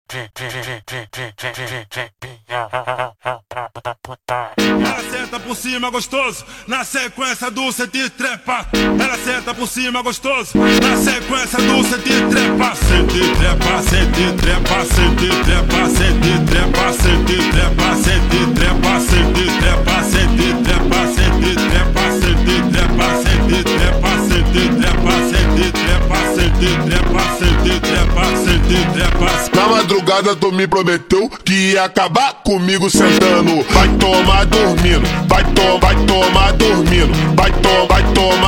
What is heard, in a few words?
Montagem Vozes Grito slowed, funk